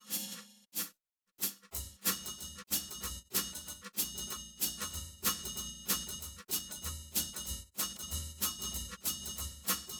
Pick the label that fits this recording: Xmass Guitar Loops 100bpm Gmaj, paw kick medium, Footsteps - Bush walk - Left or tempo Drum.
tempo Drum